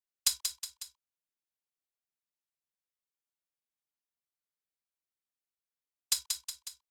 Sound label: Drum Loop, 82 BPM Percussion; Cymatics; Vibes II Drum Loop Collection; Distance Drum Loop